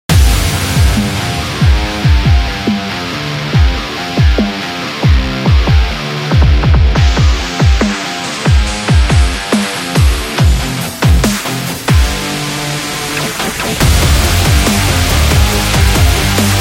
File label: Powerful Metal Dubstep; sports training energy